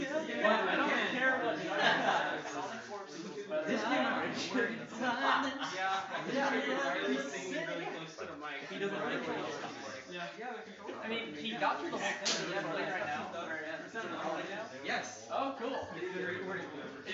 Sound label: background crowd audio; Project Undercover